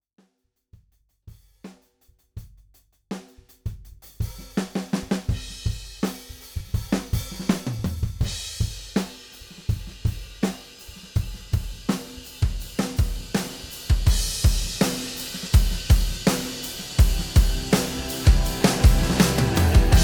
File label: Groove, drum